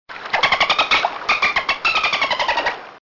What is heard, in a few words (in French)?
dauphin dolfijn; WWF Rangerclub